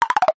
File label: cartoon wood knocks fast crazy